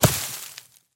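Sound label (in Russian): звуки падений человека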